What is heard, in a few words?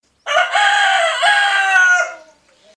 Czech Cockrel